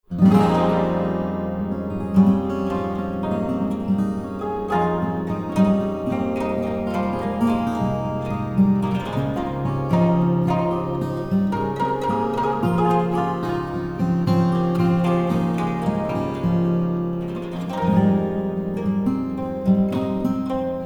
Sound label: Improvisation; First Improvisation